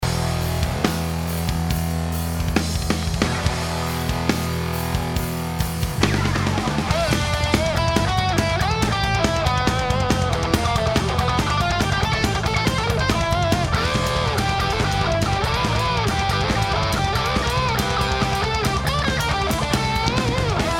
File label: punk band solo; reamp